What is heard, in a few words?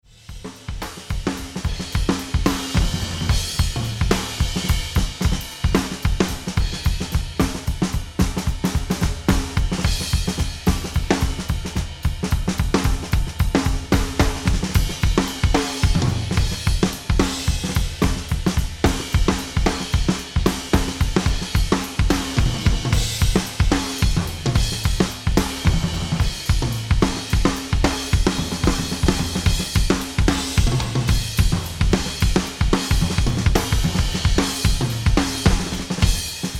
Drums OH